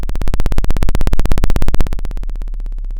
Sound of a chainsaw

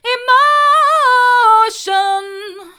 emotion hard, vocal

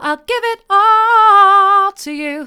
mid, vocal

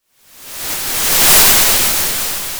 Sound of waves crashing on a shore

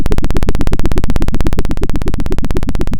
pop, Sound of a helicopter

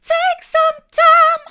take some time mid, vocal

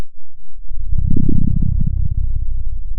Sound of a dinosaur

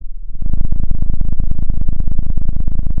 pop, Sound of a cat purring

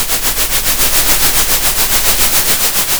center, Sound of a train

